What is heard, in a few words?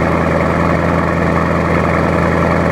Amg C63 Idle